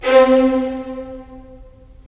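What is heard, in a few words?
strings violins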